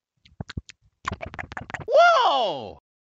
surprised look; shaq hot ones; green screen

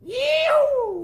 yeehoo

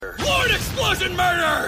explosion

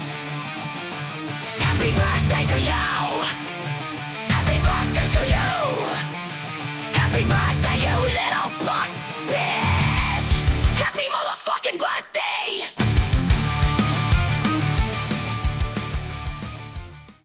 metal happy birthday

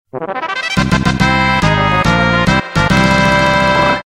music victory fanfare; final fantasy v

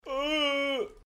ooooooouuuhhh

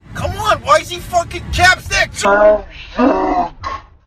chapstick

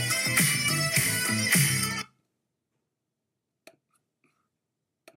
guitar

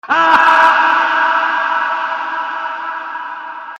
laugh reverb

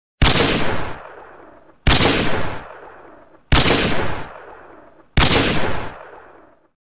intervention hd shot sound

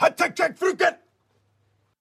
lalalalal

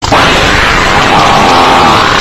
demonic fart

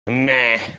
mmeeeee

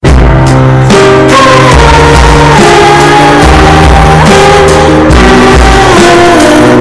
sad music bass boosted

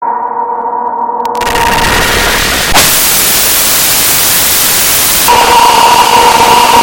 death sound variations; lego yoda

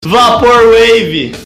vaporwave

